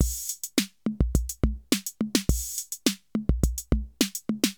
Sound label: Analog Drum Machine compressor